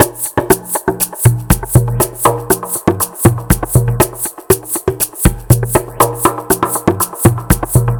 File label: Ethnic Drum Loop; Sonic Foundry (Sony Creative Software); The Electronic Music Manuscript Collection